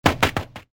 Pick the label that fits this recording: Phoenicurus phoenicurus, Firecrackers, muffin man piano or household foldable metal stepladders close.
Firecrackers